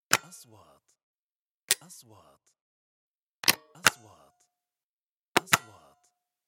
نغمة حركة ميكانيكية; transitions

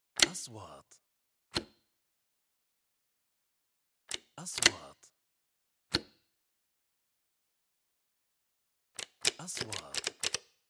نغمة كليك; transitions

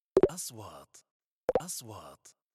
نغمة نقره مائية; transitions

